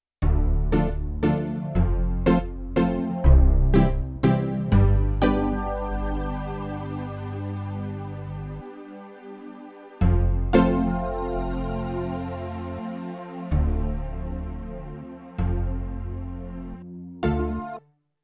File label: Pluk Performance; Yamaha TX16W; Spacey Keys 2